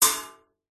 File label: impacts metal wire basket hit strike